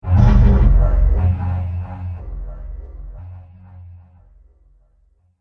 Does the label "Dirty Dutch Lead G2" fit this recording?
No